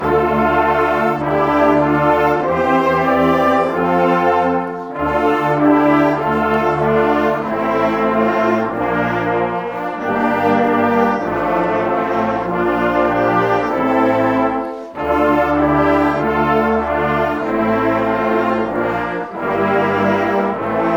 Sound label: brass, ohne oberstimme; coronabrass; christ ist erstanden